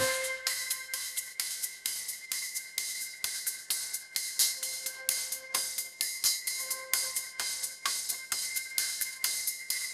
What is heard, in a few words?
corporate sting